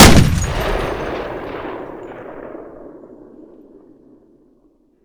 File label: shoot, m2hb; tacz; RFP v1.0.0 beta2